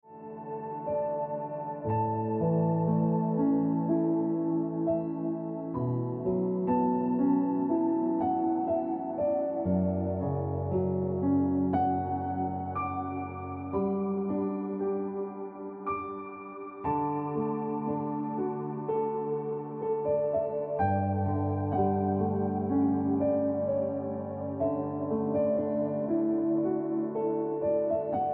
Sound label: Ringtone